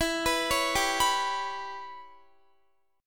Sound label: E Minor 6th E, Guitar; Em6; arpeggiated; x,19,21,18,19,18